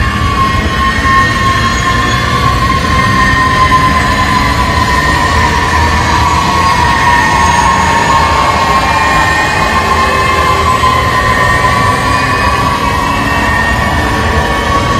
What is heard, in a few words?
Heavy Projectile Cavitation; Soundproof Walls 2.0